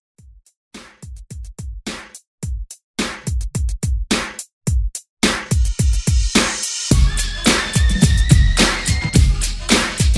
Old School Redrum

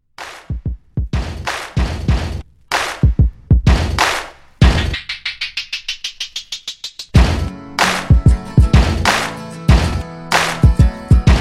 Transition 95 128

